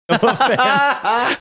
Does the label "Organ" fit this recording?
No